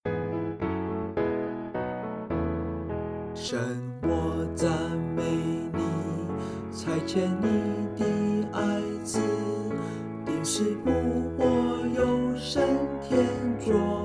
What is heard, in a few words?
vocal; Hymns; Chinese